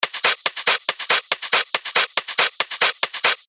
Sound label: techno loop 140 BPM; Pantheon; revealed techno top loops vol 8